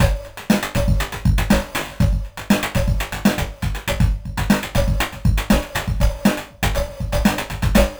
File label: akeybreak 120bpm